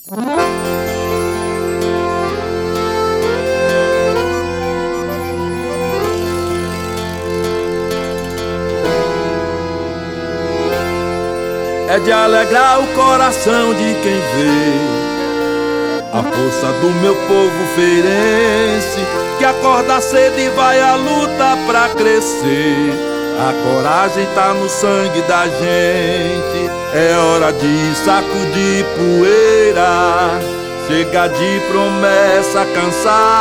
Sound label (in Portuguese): jingle parceria forte